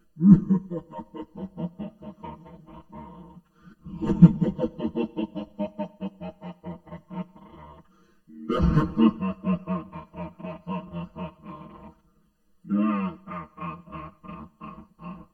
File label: evil laugh